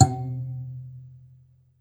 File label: BASS KALIM, African Melodic; E-MU Producer Series Vol. 7 – Old World Instruments